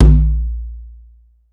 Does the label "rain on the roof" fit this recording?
No